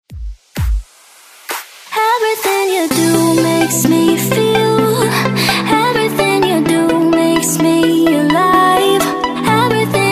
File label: House Redrum